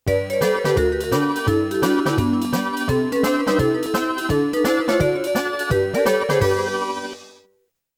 BGM Game Over; Namco Classic Collection Vol.1

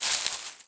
Grass footstep

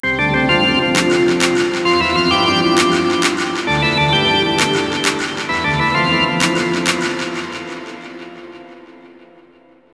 Str G#

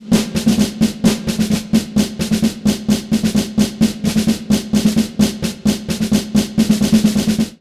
snare jumper 16 beats

